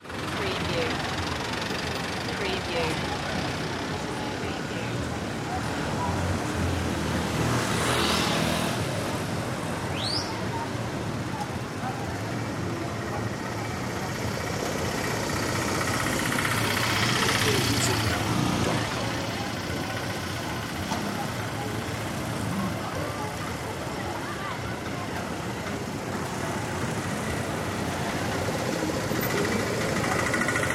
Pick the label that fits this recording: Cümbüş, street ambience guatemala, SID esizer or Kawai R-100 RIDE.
street ambience guatemala